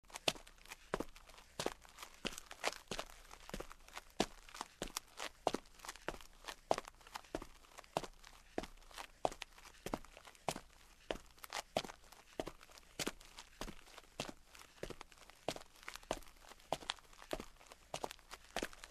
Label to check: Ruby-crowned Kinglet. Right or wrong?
Wrong